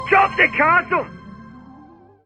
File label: jomp